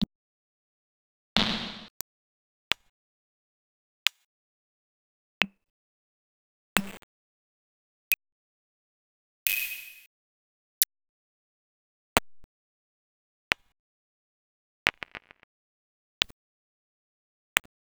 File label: Tips & Snaps; X-Static Goldmine 5